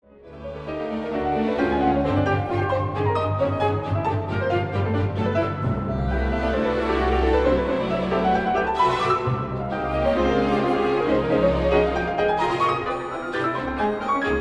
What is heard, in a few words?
Concertino for piano and orchestra